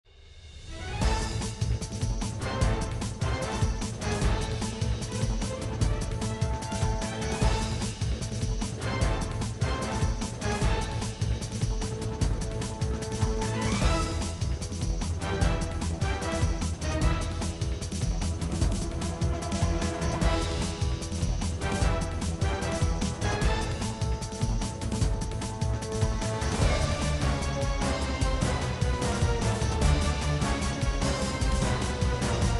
Fight; miraculous puzzle hero android ios mobile gamerip 2022

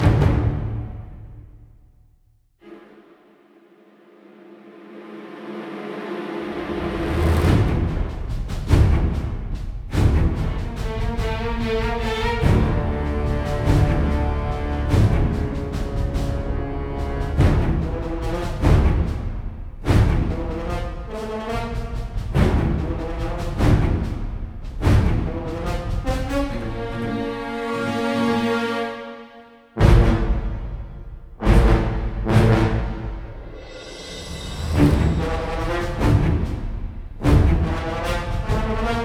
Fight Theme Tension; ryse son of rome 2014

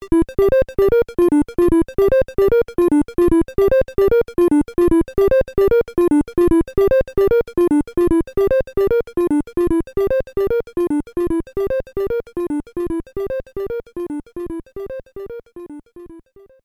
Bonus Dropping; Balloon Fight; animal crossing full gamerip

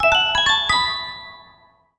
Level Up! Fanfare; Pokemon Level Up!; pok-mon sleep mobile gamerip 2023

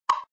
Button Click

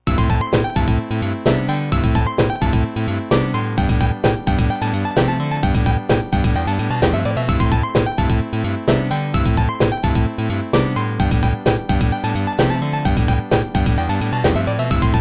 Shooting Stage; Sega Arcade 80s Vol.2; Wonder Boy Iii

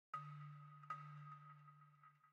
Balafon; Frozen Flame; windows

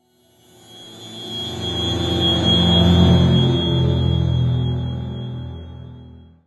Camera Transition

